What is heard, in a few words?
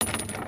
chain; creatures organic